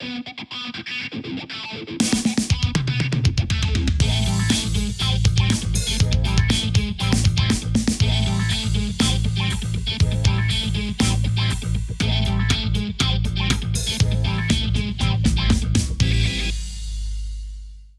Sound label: Drum Intro 120 bpm